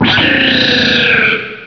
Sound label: Cry; Pokemon Essentials; Pokemon Iberia V1.03